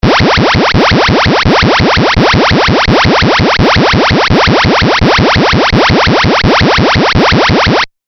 8 Bit Style Forcefield, video game sound effects

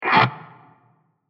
Short Creepy Transition; Scary Tones; Horror; Crafted Signals

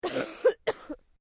Female cough medium; Female vocal sounds; Clearing Throat; Human

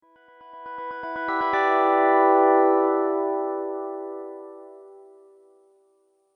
Menu Transition, transition sound effects

